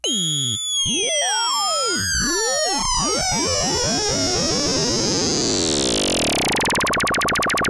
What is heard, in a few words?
MOOG A#3, Synthesizer; Hollywood Edge; Giorgio Moroder Rare Synthesizer Collection; MLTI.MOOG